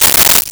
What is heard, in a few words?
Coins Poured Out; 1001 Sound Effects